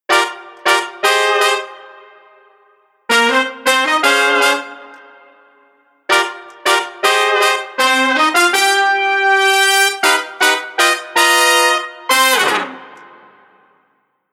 Horn Non Edit

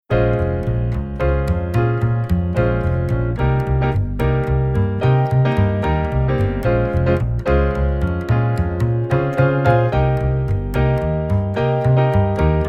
Jazz Waltz Bass

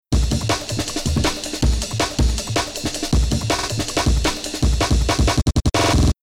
Rhythm DnB Loop Chop

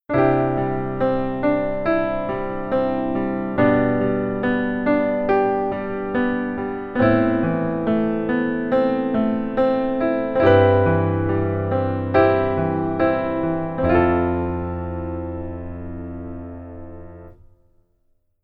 keyboard rhythm

